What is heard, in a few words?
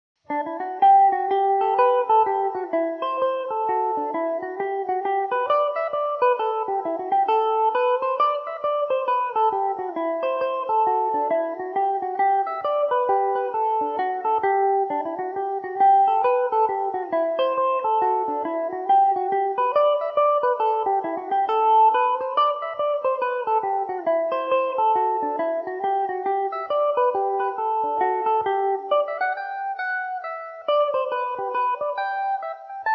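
hornpipe G major mandobird, irish mandolin; charlie mulvihills hornpipe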